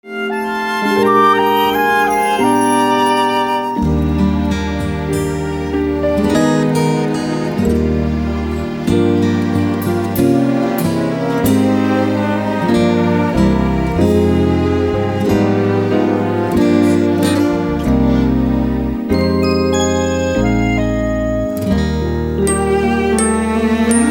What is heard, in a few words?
Instrumental; audition materials; Willy Wonka Jr